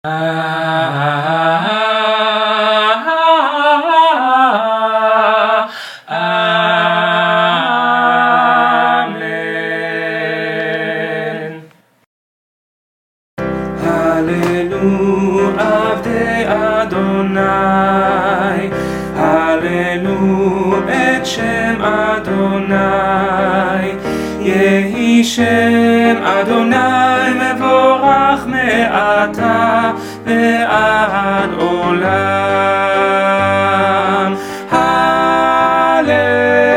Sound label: Hallelu (all voices), choir